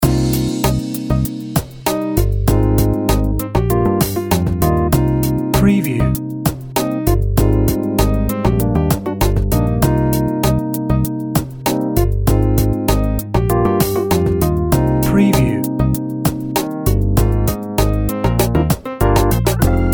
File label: Soft Jazz